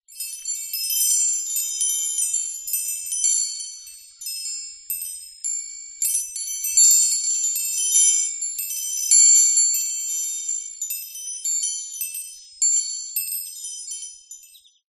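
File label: Windchimes Outside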